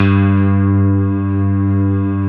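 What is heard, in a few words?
synthesizer, digital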